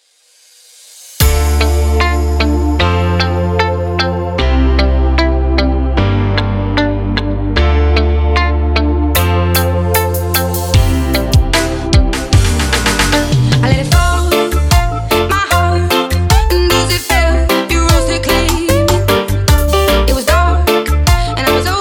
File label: Reggae Remix